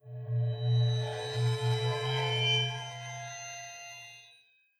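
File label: underground ambient; blackforest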